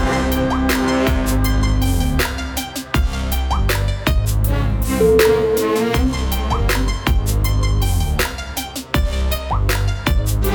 epic oriental trap

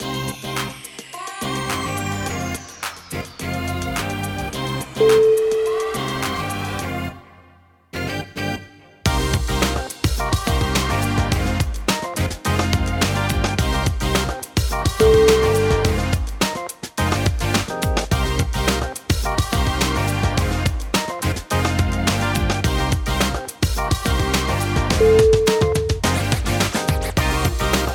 funky friday

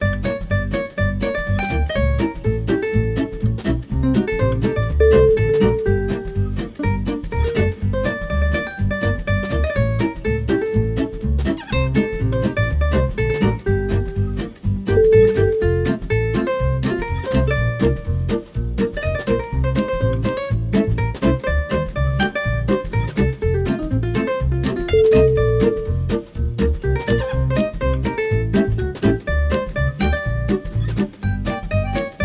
vintage streets

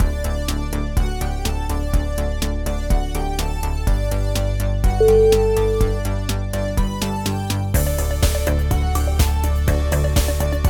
synthetic pop

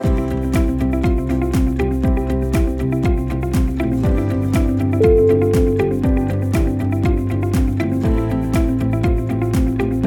inspirational promotion

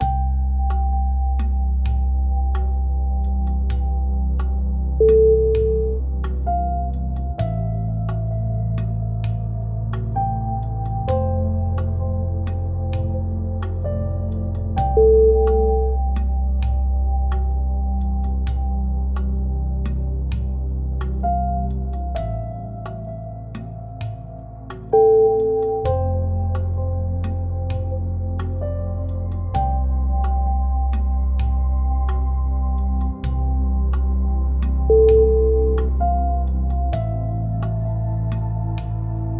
meditative desert

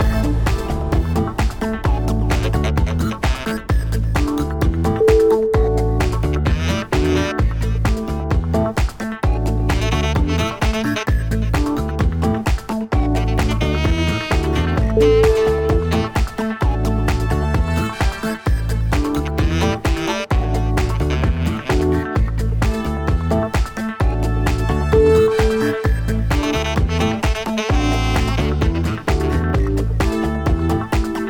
retro funky groove

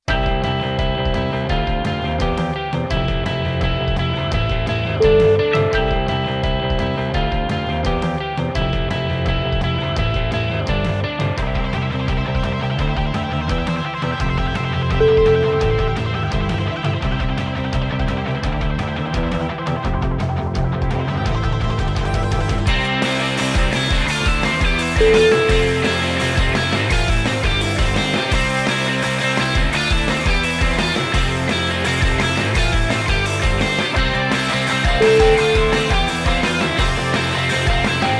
american college

